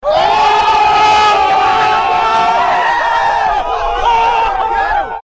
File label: SUPER HOT FIRE OOOHHH